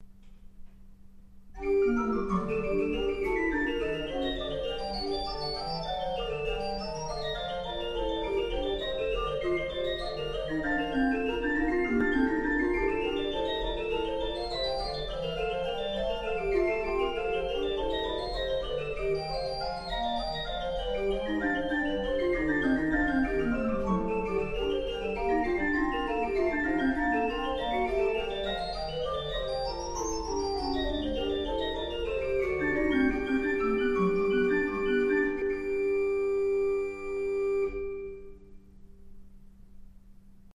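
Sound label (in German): Godefroy Hartmann 17 II P, pfeifenorgeln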